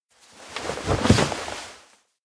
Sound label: sit cafe counter; anemoi